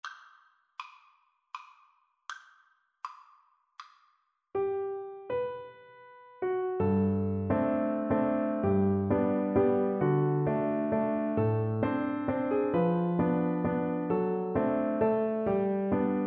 emperor waltz viola

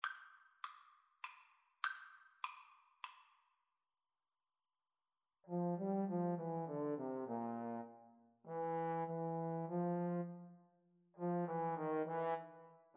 Trumpet Trombone Duet

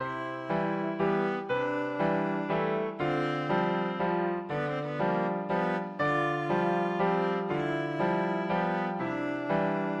tchaikovsky flower waltz, viola

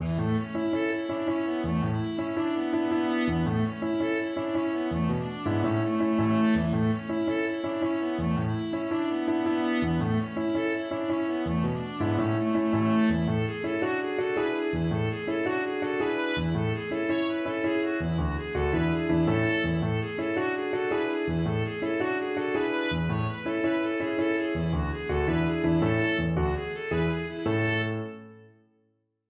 give us a drink, viola

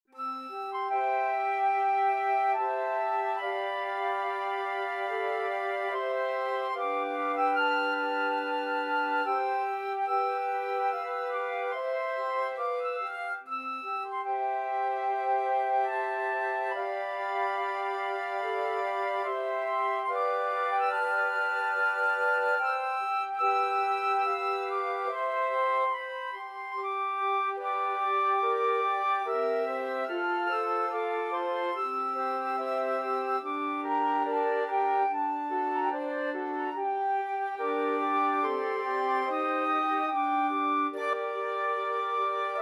raindrop, flute choir

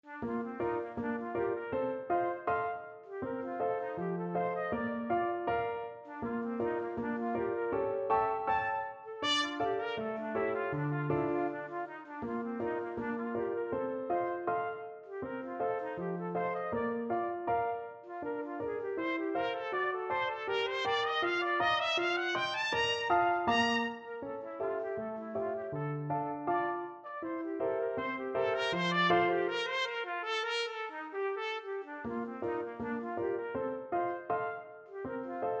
pizziccati, trumpet

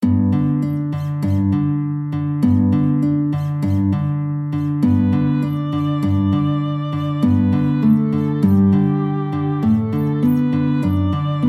guitar violin, duet; dvorak largo

